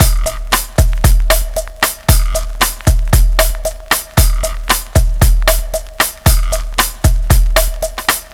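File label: drum 115, Bossa Back 115bpm, Breakbeat; Breakbeat 2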